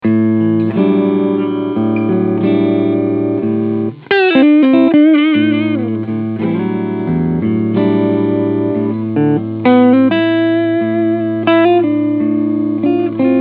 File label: Epiphone Les Paul 1960 Cleansound Front PU; Bonamassa LTD